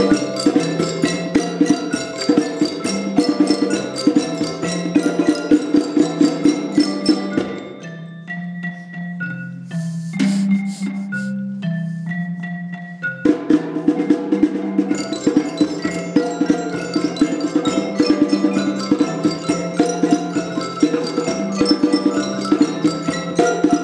lovely xylophone piece performed by little london primary school; at leeds music education